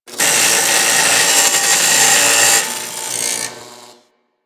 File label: Worker Grinder Idle, weapons; Grn Worker Grinder